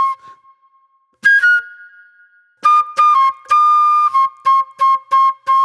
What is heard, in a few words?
Wild Flute